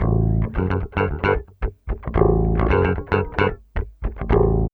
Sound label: BASS, Funky Ass Loops; East West